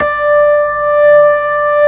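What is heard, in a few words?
ORG B3 D 5, organ; Best Service Gigapack 2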